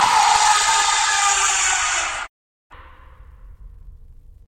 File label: jumpscare sound; five nights at asylum